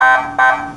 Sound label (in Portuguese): buzina policia